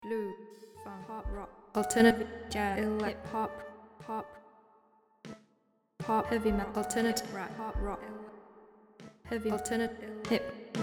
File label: Jazz